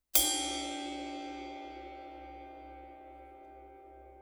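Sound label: heavy ride 20 bow, cymbal; sabian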